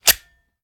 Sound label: raise first close, reloads; gmod